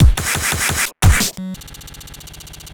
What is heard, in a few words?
Glitched Beat 175BPM; glitch kit